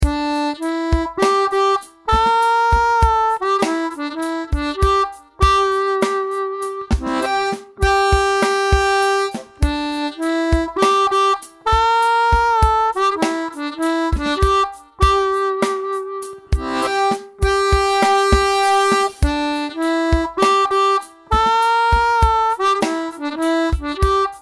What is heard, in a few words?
harmonica riff slow